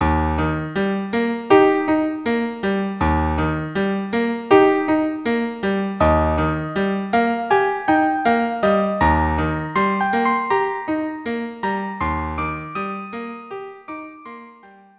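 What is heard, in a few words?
intermezzo violin viola cello; carmen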